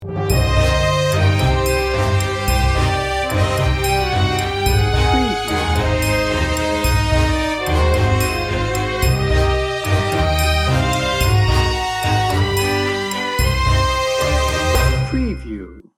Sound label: housewives tango